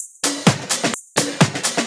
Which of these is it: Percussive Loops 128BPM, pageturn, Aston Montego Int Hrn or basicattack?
Percussive Loops 128BPM